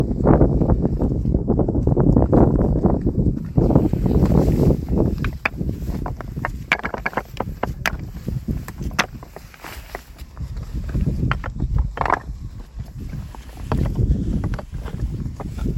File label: hearting drop